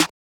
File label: Snare; Jetson